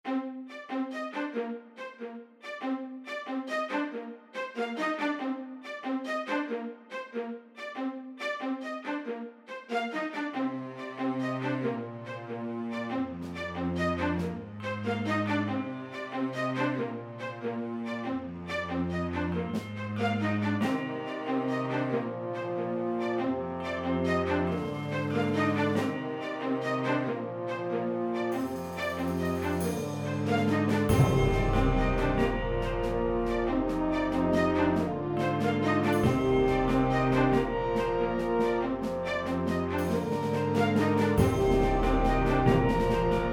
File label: Orchestral